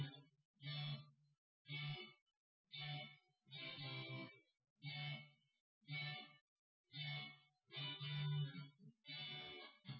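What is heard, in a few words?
test with drums synth conv 250ms 1m sim; SiSEC10; underdetermined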